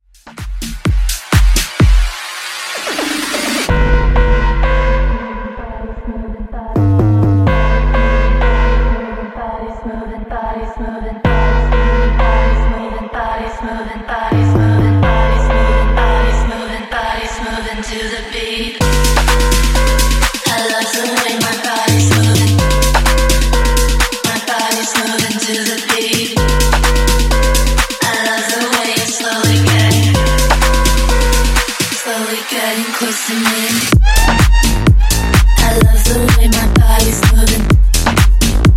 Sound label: Funk Bootleg 127Bpm